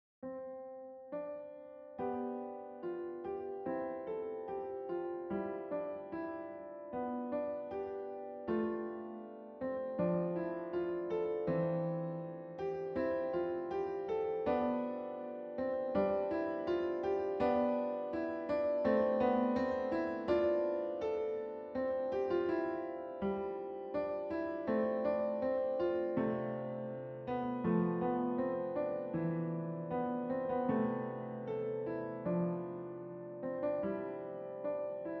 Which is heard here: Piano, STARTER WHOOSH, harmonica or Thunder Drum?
Piano